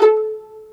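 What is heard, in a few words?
PIZZ VLN E5, violin; AKAI S-Series CD-ROM Sound Library VOL-2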